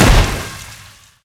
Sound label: impact; Smite; Vulcan